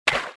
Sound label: water run, footsteps